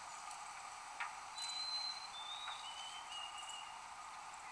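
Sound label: White-throated Sparrow song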